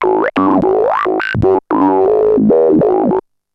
TALKING MIDI, SFX, TECHNO; Syntec; Wall of Sounds; TECHNO-BOX

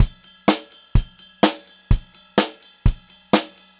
126 Pop-Rock Drumloops; USB Soundscan vol.37; AKAI

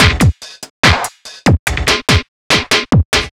Crunch Fill, Drums Beats

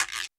Roland U220 Electric Set, Drum Machines

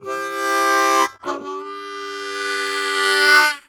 Harm 129bpm G end, Harmonica; Quazibeat - African Secret

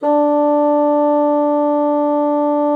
bassoon, D4; Xsample VOL-6

